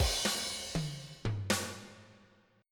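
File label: rock break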